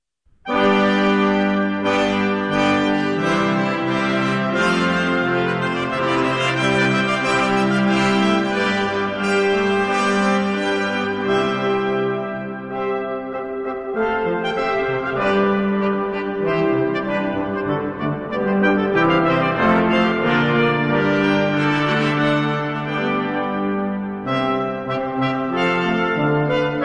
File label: Symphony Brass